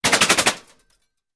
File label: Bullet Impacts Metal